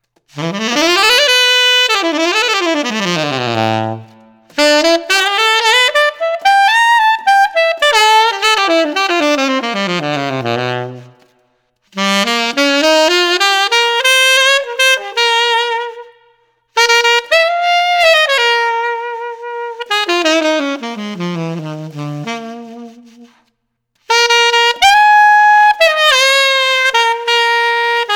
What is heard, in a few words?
Alt Reverb, tenor saxophone mouthpiece; les becs dautan hollywood tenor saxophone mouthpiece